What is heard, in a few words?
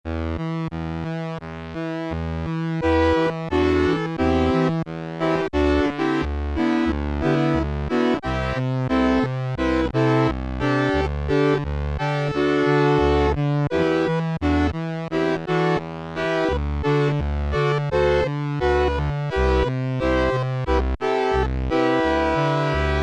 COMP SYNTH, Oberheim OB-8; Factory Patches